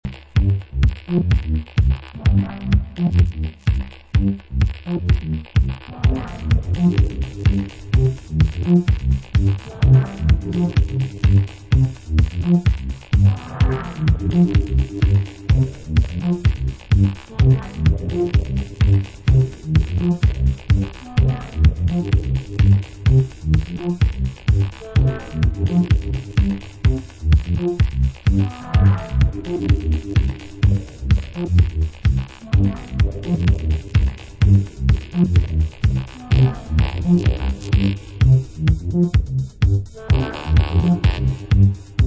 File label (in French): orgue electronique remix